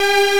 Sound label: Fanfare; SNES; Untouchables, The